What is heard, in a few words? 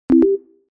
channel in